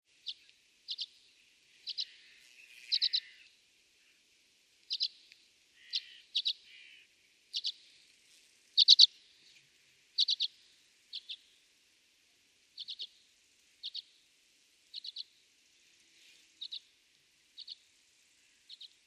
sizerin flamme; peter boesman

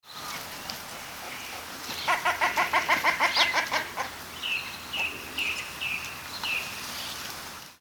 tadorne de belon

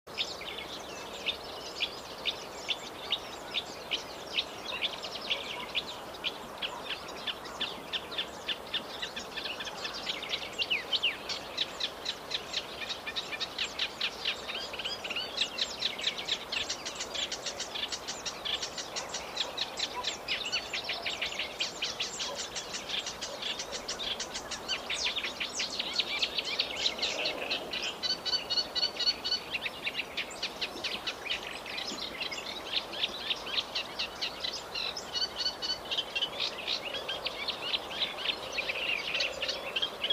rousserolle effarvatte, acrocephalus scirpaceus

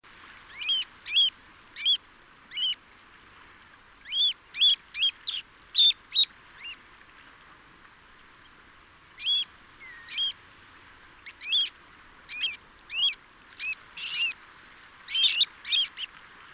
becasseau variable calidris alpina alpina

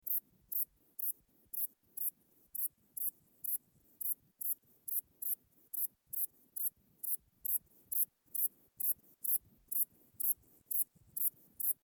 ephippigere des vignes

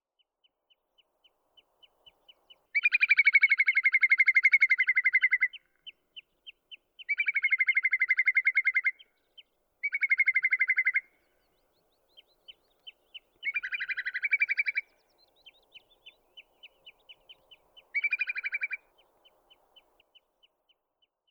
courlis corlieu numenius phaeopus

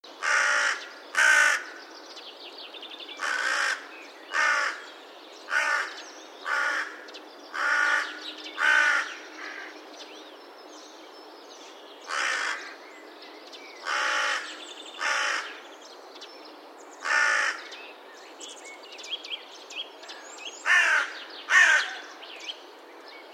corneille noire

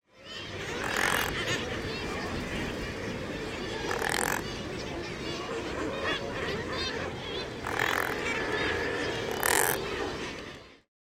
petit pingouin alca torda

